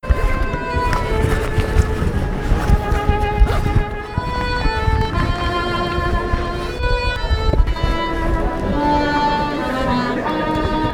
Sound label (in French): ambiant soiree pizza film